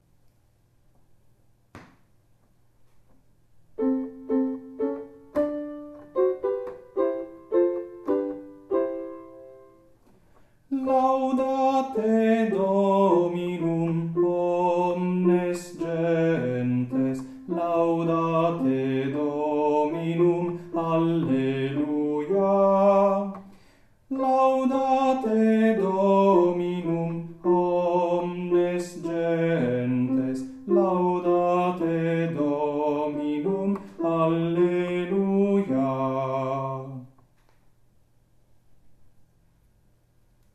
Laudate Dominum bassi; Laudate Dominum 2011

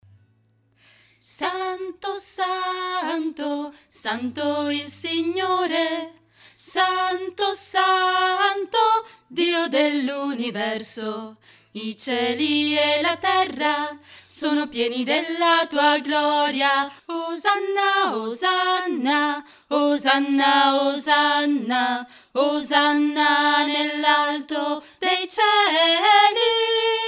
contralti santo

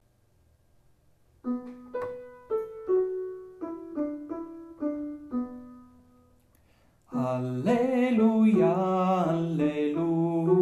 soprani Alleluia

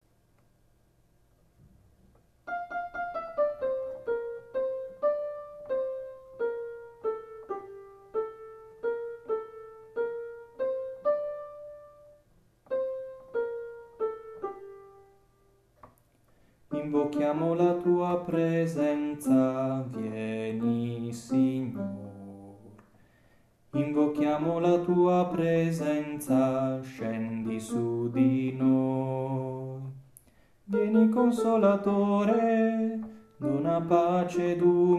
Tenori

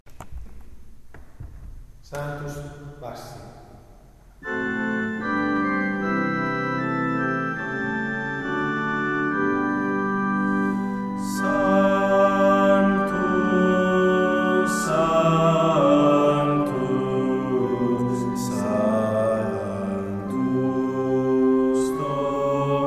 Sanctus bassi; Sanctus Lourdes

